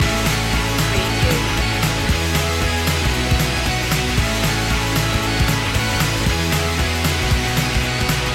rock me indie loop

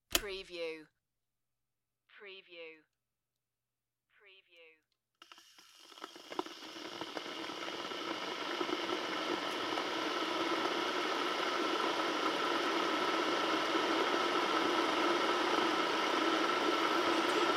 Kettle Boil; beanstalk audio

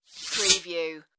computer interface message

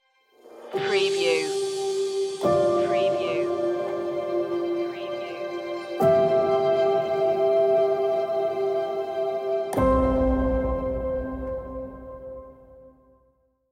a new movement stinger

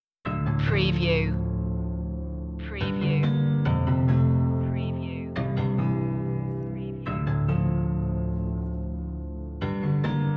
no percussion

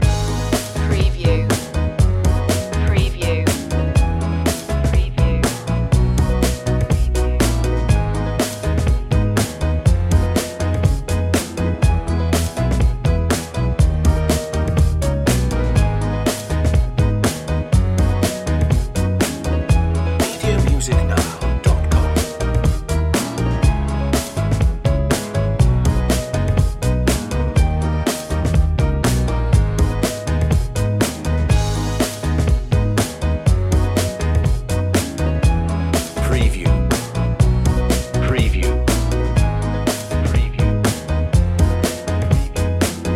underscore loop

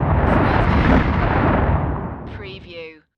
Shockwave Pass By; sound effects bible

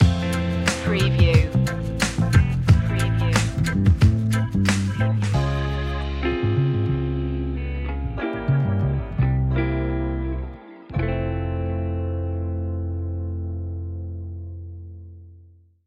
jazzy chill relax

